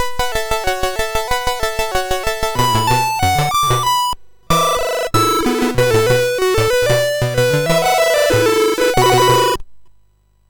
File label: sid MOS6581R3, GAMES; Continental Circus